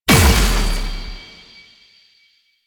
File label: Fusion Pillar Pulse; Warframe; Qorvex